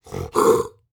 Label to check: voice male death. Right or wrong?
Wrong